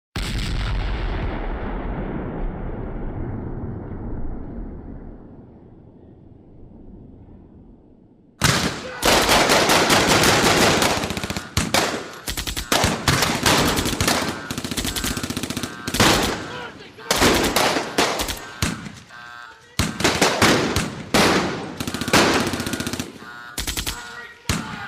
battle

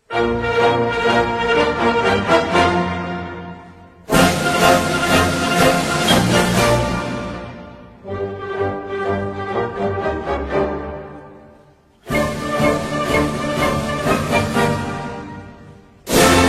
National Anthem of Italy; Il Canto degli Italiani

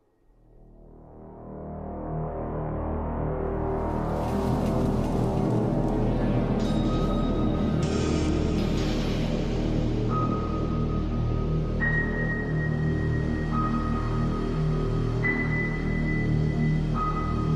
epic dark orchestral remix; trainer red theme

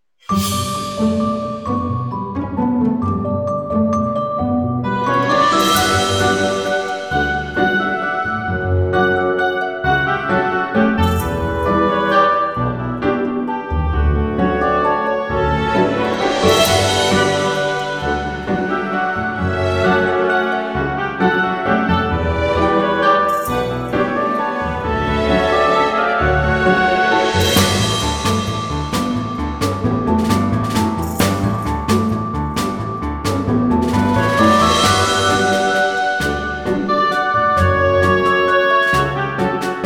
Funny Wedding AI Music

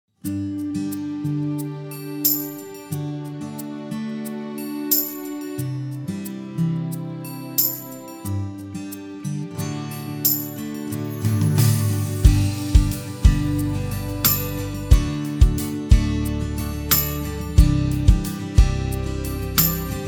jingle Instrumental; Botero Soto

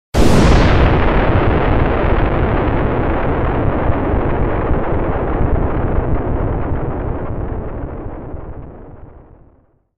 Explosion Sound; Explosion 4; Efecto de Sonido Explosion 4

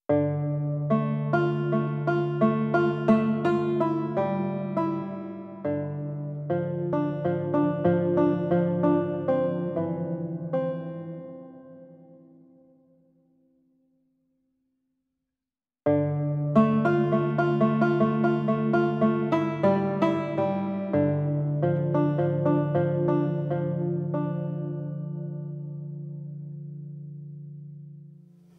Music; Homestead a Cyanide Happiness Short

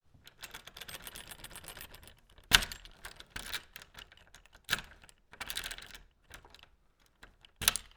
Key In Lock

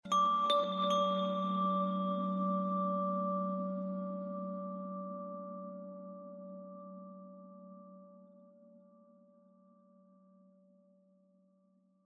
ambient chimes

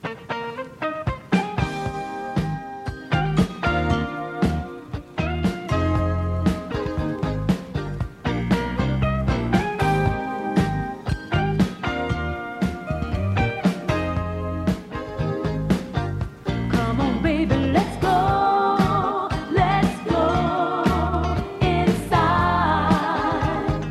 Funk, Modern Soul

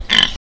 Pig Snort

